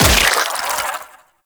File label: Loot Crate Cyst Burst; Ambience; Gameplay; Warframe; Infested Eris